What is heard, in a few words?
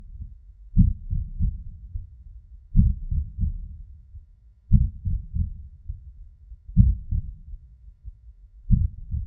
heartbeat